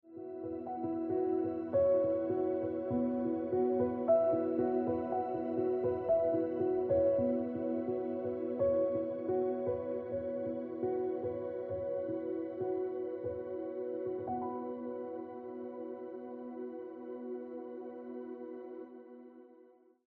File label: Piano Music